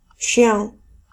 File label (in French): chien; cane